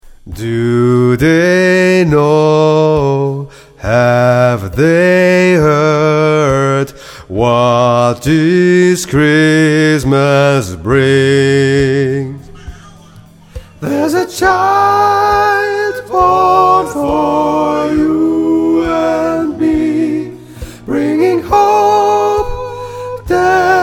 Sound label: Alt, gospelchor